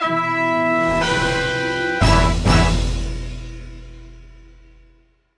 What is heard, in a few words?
Jingle; Laser-Washing Powder ~Shock Rocket~